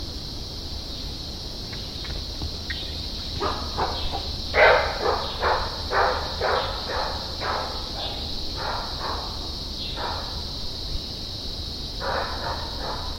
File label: red muntjac, mammal calls